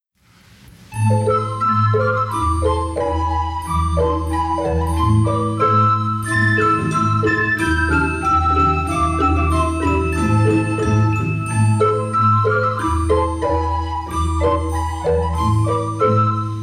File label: Recorders with Instruments